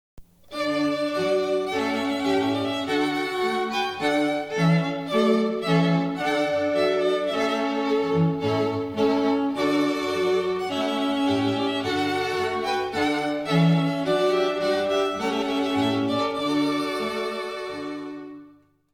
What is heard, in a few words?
trumpet voluntary